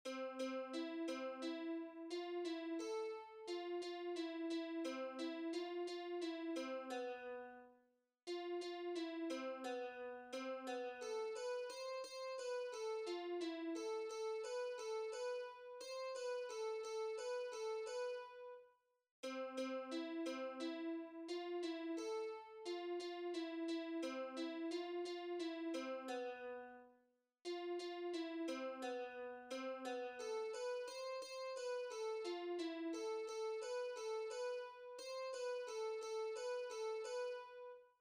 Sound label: japanese traditional; kazoe uta